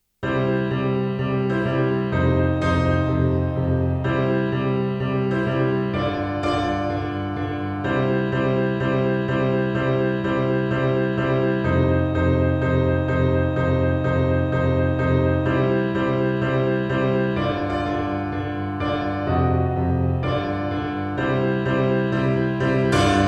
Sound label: piano pop